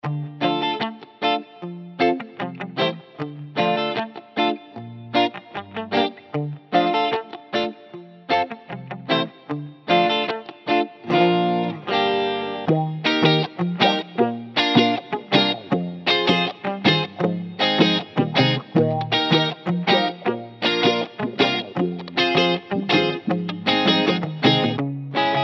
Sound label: Reggae Guitars